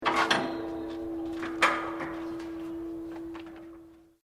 cylinder gas presurized empty fastened, Home heating gas bottles being replaced; Machinery